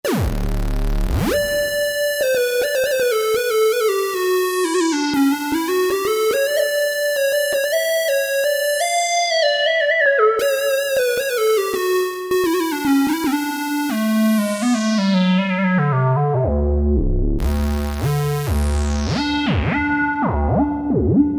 Lucky Lead, Kurzweil K2000; Kurzweil K2000 V3; Factory Programs

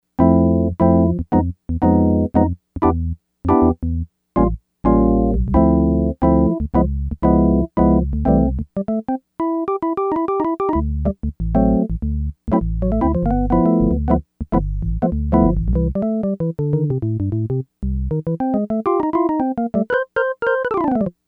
Club Organ, Yamaha DX100; Factory Patches

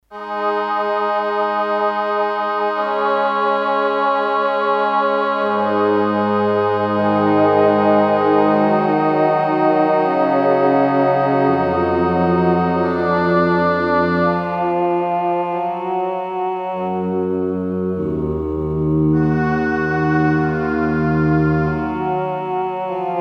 Tenor Voices, Roland D-10; Factory Patches

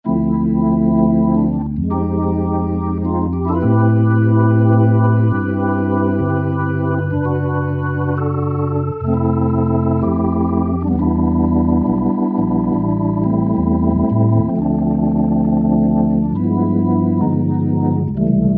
JV nClic, Yamaha A4000 Organs; Piano - Keyboards

